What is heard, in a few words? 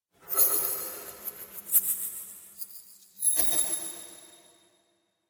Magic Illusion; Magic Sound Effects